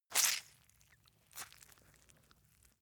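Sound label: rag leather wring out, household; haus-garten; haushalt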